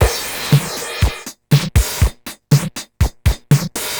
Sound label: Distorted TR 505 (120BPM), beats; musicradar; cyborg beats